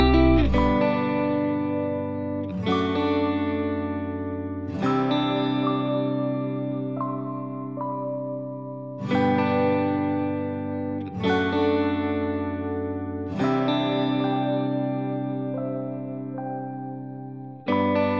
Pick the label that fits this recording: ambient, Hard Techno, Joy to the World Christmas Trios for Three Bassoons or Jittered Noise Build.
ambient